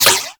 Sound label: ricochet; Warframe; Cowgirl